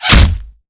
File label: bullet light ballistic flesh; Titanfall 2